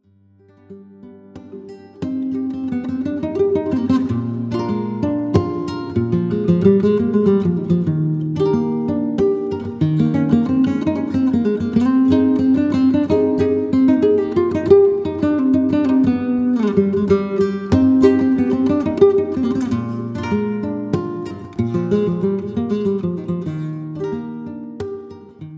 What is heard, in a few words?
Solea, guitar